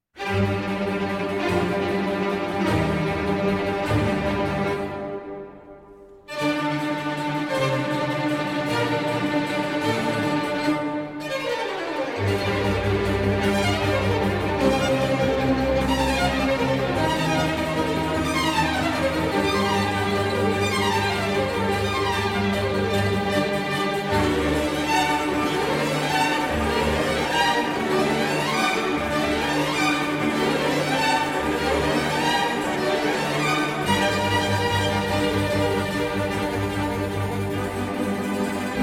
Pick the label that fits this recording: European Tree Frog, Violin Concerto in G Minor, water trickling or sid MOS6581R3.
Violin Concerto in G Minor